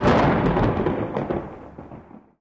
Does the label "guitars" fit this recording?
No